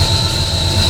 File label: ELEVATOR Movement Loop Noisy Squeel; mono; Universal Sound FX; ELEVATORS & LIFTS